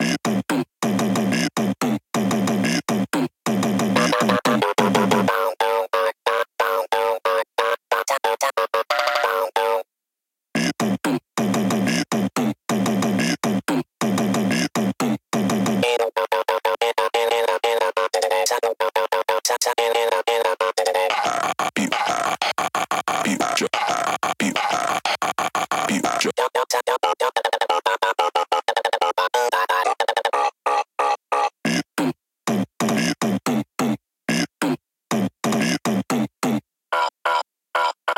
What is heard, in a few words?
Voices